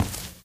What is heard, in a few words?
walk tile, footsteps